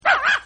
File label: Little Dog YAP; Dogs; Animals